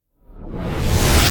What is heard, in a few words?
cinematic reverse